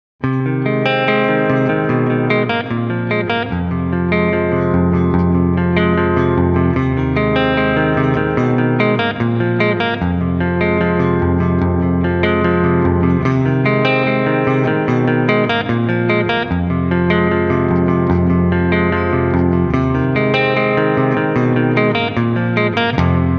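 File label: Intro Clean, guitar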